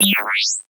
Simple Tone; Digital Rain Lab; Signal Frontiers

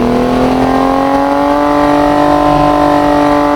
atv downshift to 2nd loop; hl2

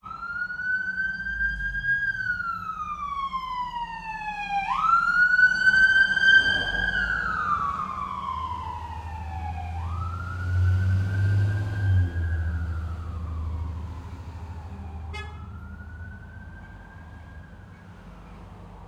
City, Buffalo, Residential, Traffic, Police; UberDuo; The ‘hood Audio Playset

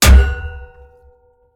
Metal Hit Crash; SoundBits; Just Impacts - Extension II

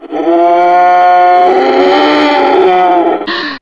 call, zombie poison; hl2

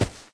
foot, fast zombie; hl2

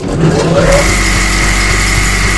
horizontal start, crane; hl2; doors

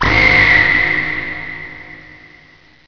Hit; hl2

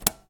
vintage radio button; 3maze

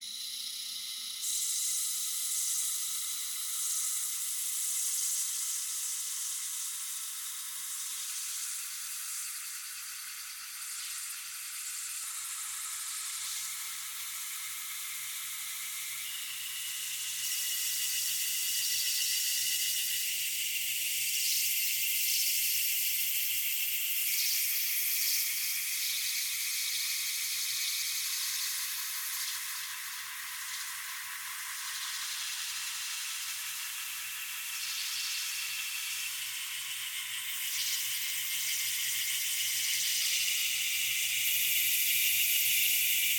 Insectoid Humming, Synthetic Drones; Sonic Bat

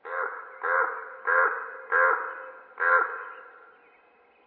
idle, crow; hl2

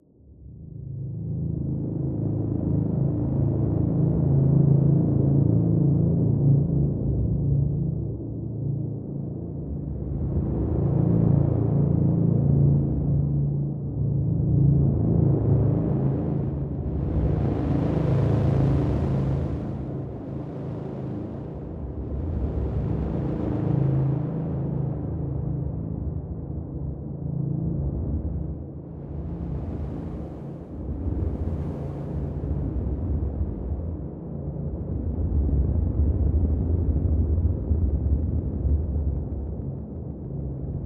drone pulsing darkness, unEarthy Ambience

